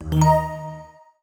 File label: Snowdrift